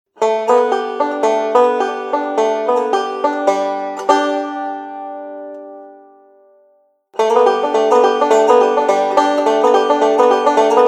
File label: Banjo